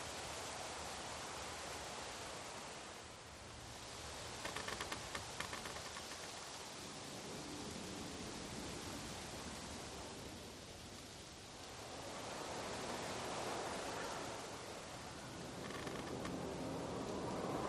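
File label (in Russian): лесная обстановка легкий ветер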